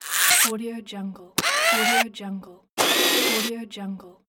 a mechanism

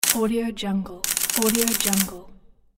camera shutter

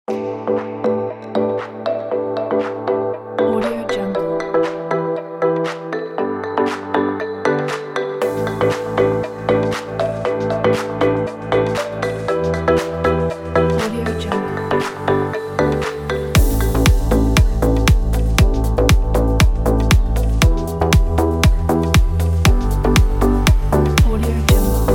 happy summer pop; selectedsounds

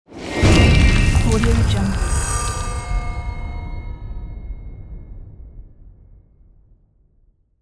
magical spell sound

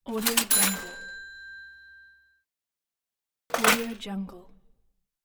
cash register

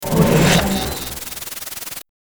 static glitch technology

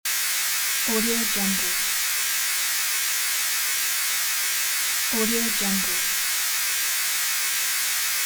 electricity noise; ponyrai

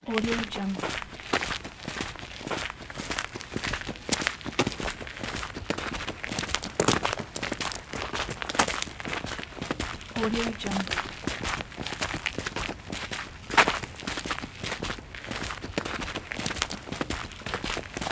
the steps; fx-market

